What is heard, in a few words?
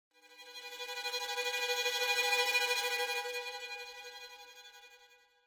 Symphonic Hit Strings Tremolo - Single, Higher; Hollywood Edge; sound designer's toolkit v2